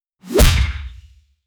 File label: cartoon fantasy axe throw